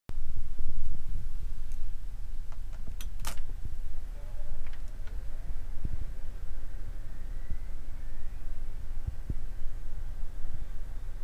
noise of cosmos

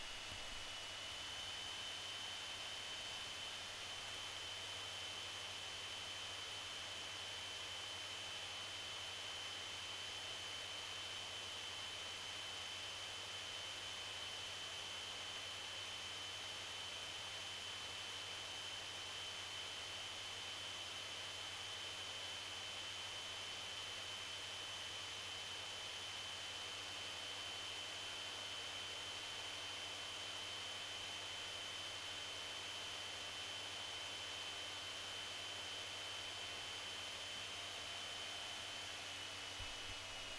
gameplay; RazerBlade2016

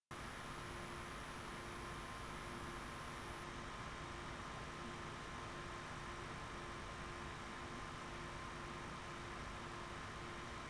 GTX590 noise; GTX590review

